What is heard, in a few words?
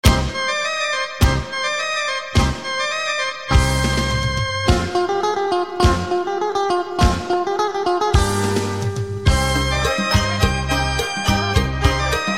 Thai Country Song